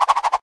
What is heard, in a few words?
Guitar roll